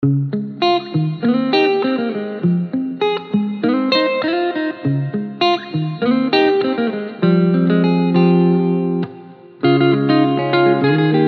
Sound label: Lush Guitars